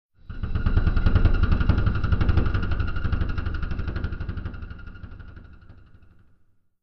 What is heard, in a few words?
theme stinger; Myst III Exile